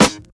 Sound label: 00s original hip hop steel snare drum sound g sharp key